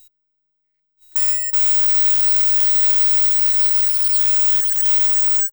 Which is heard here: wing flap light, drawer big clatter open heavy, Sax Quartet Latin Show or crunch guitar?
crunch guitar